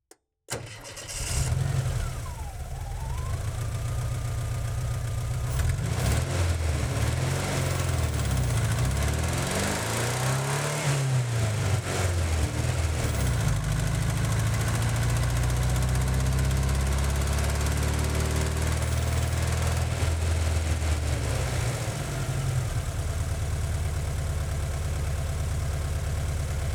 Volkswagen Van, Onbrd, Start, Fast, Drive, Stop, Reverse, Stop, Drive, Stop, Off, Engine; Volkswagen Van 1978